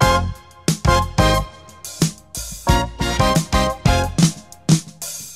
full, Loops Phonky

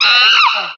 scream

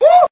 "woo"

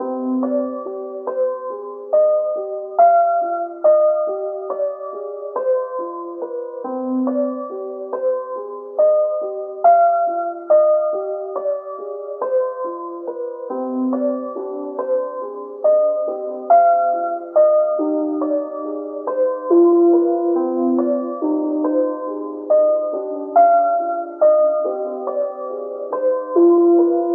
piano, sad

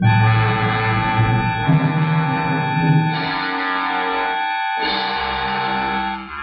horns, jazz loops

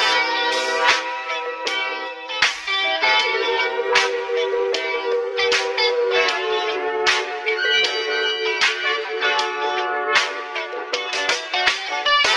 guitar blistering

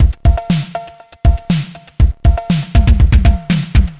cowbells linger, Drum loops, Electro

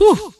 "woo"

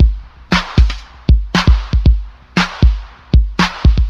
Drum loops, Electro

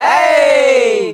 ay group of men, voice ooh ahh

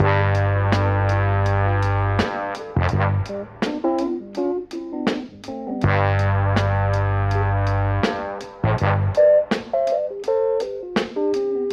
horns and keys bold yet smooth, phonky loops

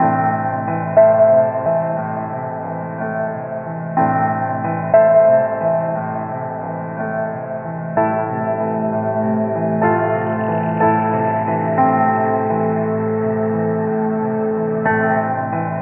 piano, Loops Sad

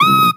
squealer, Stabs

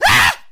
scream

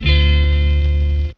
plung, Guitar; Notes

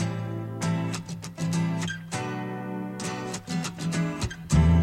guitar country, Loops, Phonky

